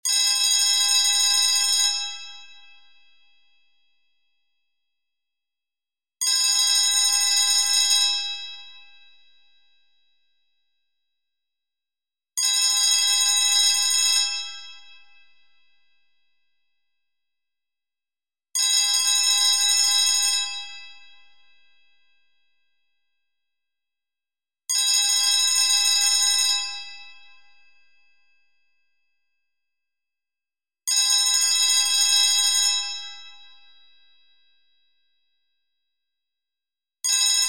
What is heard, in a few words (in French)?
Telephone russe